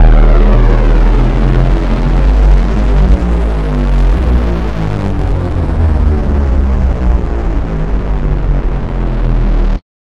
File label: Ship warm up